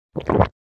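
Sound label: sounds of swallowing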